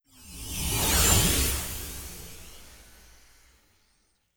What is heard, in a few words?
Magic Spell